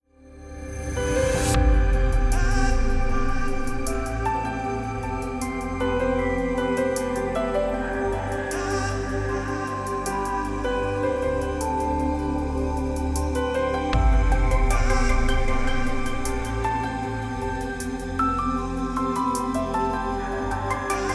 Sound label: FutureBass Structure Verse